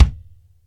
rich mid range steel kick drum sample a sharp key

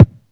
solid kick drum sound b key; tuned drums

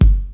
smooth bass drum one shot g key

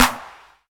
clap single hit g key, Loudest frequency: 2854Hz; percussion; single hits one shots

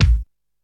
old school verby bass drum single hit A key; kick drum samples

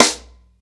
airy snare sample a sharp key

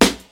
sizzle steel snare drum sample c key; tuned drums c key

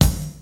trebly steel kick drum g sharp key

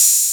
sharp open hat one shot a key

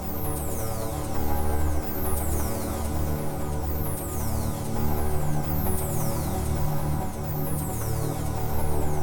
techno riddim fx sequence with tops - D; loops; synth

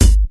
00s rich highs hip hop bass drum single hit g key

